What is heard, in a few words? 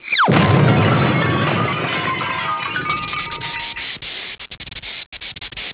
sound error